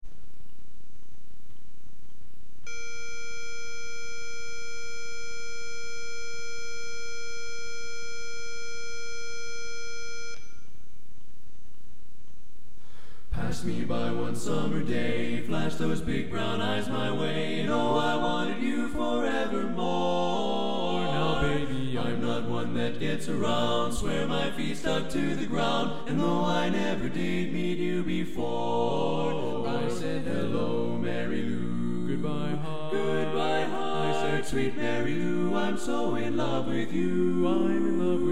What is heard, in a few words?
Tenor